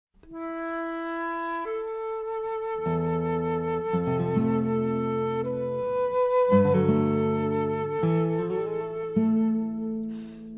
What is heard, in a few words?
Flute Guitar